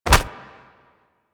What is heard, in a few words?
Hoverboard Grab; Warframe; Vehicles; Venus; anim sounds